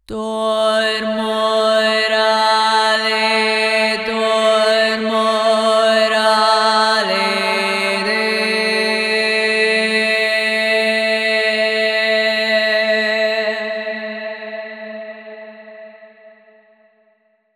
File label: Chant Vocal - Am 120Bpm (WET); Construction Kits; VOCALS; Ghosthack; SkyRealm; Kit 05 - Heart Of Fire